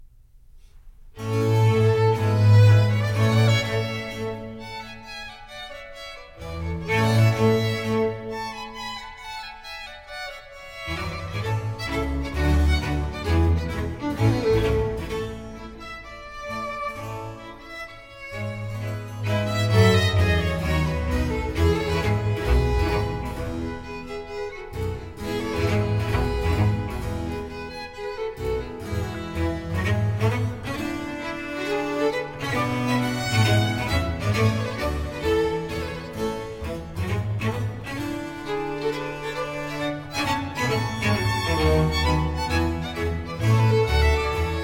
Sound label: concerto for cello strings and basso continuo in b flat major; I. Allegretto